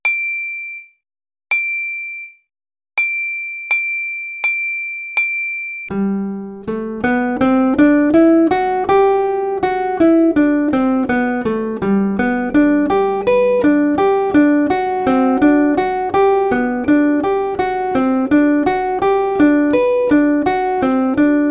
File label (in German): Tonleiter auf G, Zither; Playalong; Neue Zitherschule